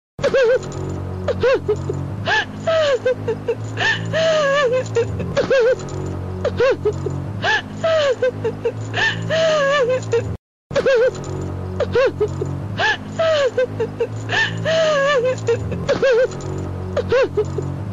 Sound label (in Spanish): sonido mujer llorando efecto de sonido